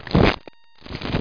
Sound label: Pack Shuffle; AntiQuadra 2.0.2; Board&Card